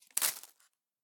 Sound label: Falling Leaf onto ground; Sounds Visual; Footsteps on Leaves